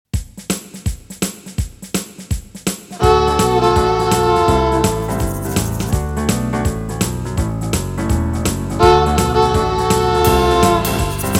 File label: galway hornpipe